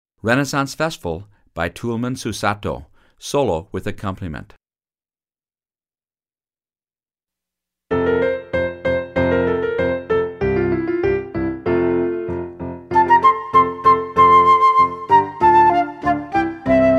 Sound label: With Acc Flute; Solo with Piano Accompaniment – Performance Tempo